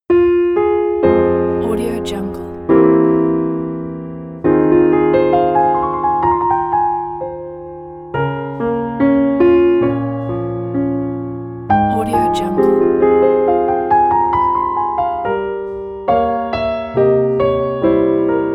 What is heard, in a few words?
jewish folk lyric; oseh shalom